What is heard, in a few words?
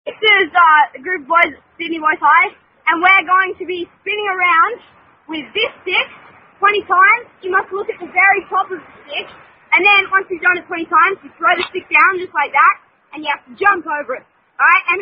Non-Drinking Children's Game In Australia; Dizzy Stick